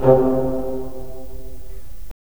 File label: BRS, Roland LA Composer